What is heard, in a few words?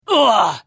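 Hit, Khajiit female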